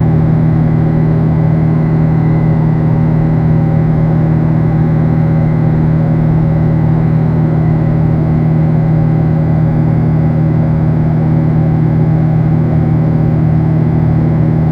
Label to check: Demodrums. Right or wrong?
Wrong